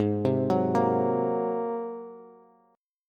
Ab 9th Ab down Bass; Ab9 arpeggiated; JGuitar

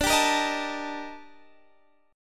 D sharp Major Sharp 11th, D

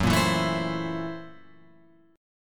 Gb 7th Sharp 9th Flat 5th Gb Guitar Open F; strummed; Gb7#9b5; 6,5,4,4,7,7; JGuitar

